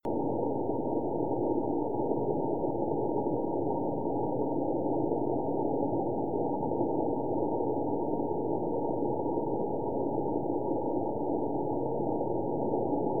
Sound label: whales upcalls; deep learning